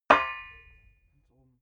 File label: metal impact pipe; hits explosionen; metall